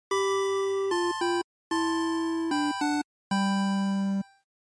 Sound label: NES Shooter Clear